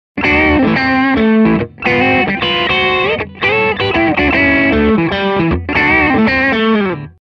chambered T bridge neck, pickups; chambered T Broadcaster PU; Suhr; Stoppin

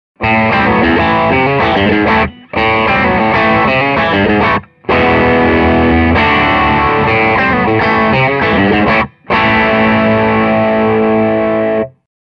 Hollow T bridge, pickups; Rockin

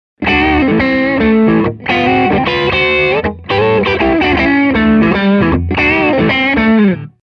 Hollow T bridge & neck, pickups; Hollow T M2 & M1; Neck and bridge together; Stoppin

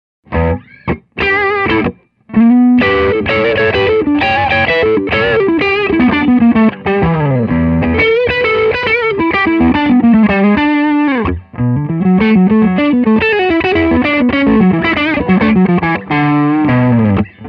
Hollow T neck, pickups; Neckin

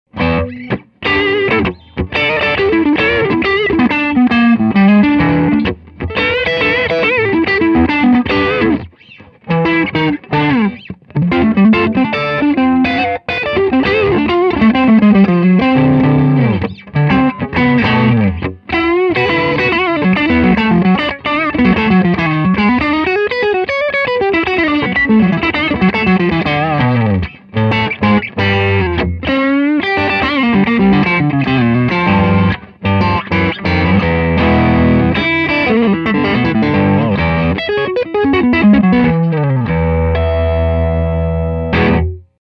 Hollow classic neck, pickups; Hollow classic VA1; Neckin, All neck PU all the time.

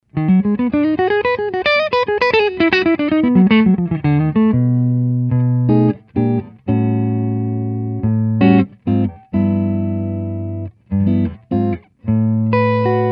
Hollow T neck, pickups; Boppin

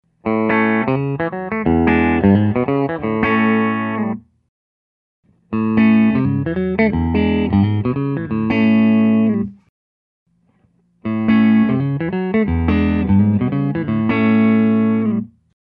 Hollow T, pickups; Hollow T TV3 & TV1; Anderson

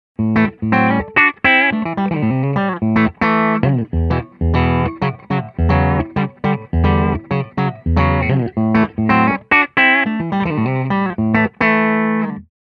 Hollow T bridge, pickups

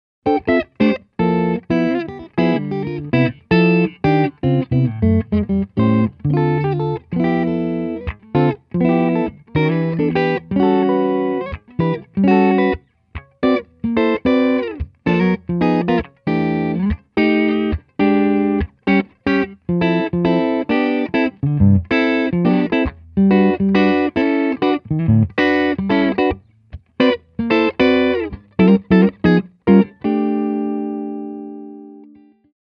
Hollow T neck, pickups; Satin

